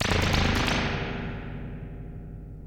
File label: Thunder